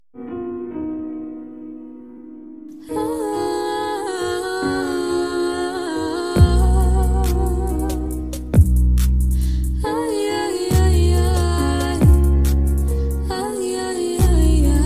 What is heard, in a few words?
Female Singer Song